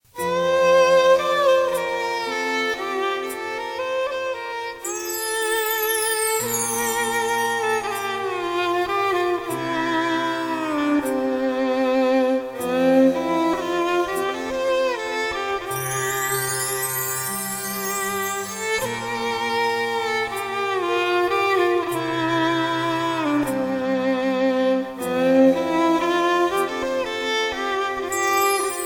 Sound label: Bhajan; Buddham